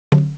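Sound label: CONGA HI; CMI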